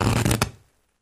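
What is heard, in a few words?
Electric Arc Short Circuit; arcs & sparks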